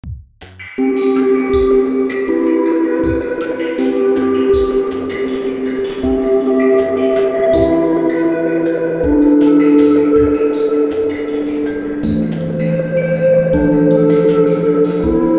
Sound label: Tones plus Percussion